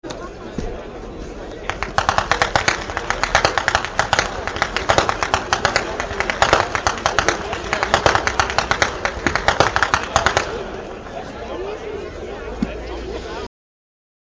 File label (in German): schnalzen; bicheln